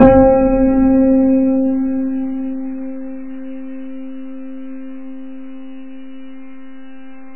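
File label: piano single muffled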